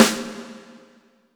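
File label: snare drum, real mega drums; Best Service; Real Mega Drums VOL-1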